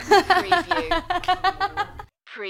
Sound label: Lady Laugh